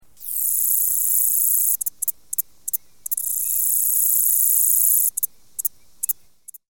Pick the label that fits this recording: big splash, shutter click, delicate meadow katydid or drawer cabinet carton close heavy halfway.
delicate meadow katydid